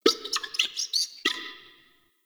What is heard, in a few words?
Owl Critters Vocal Shake; Ambience; Warframe; Duviri